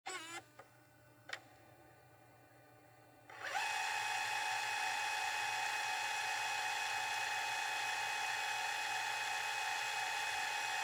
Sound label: Technology Dv Camera Rewind Panasonic Dvx 1080 Camcorder; Cameras; SFX Bible